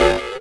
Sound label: stab; awe32